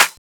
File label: Snare; pitchglitch samples; DNBACADEMY LIQUID STARTERKIT